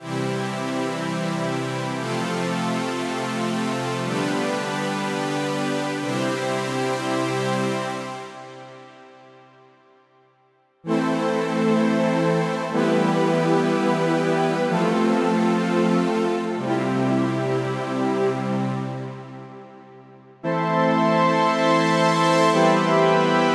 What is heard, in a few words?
strings